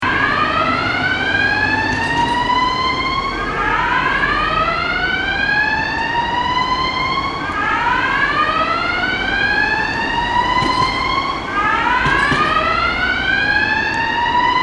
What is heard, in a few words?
Alarm